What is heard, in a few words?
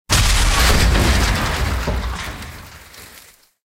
wreck explosion; War Thunder